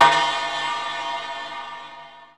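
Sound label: VP-1 MALLET; E-MU Formula 4000 Series Vol. 2; OrbitPercMaster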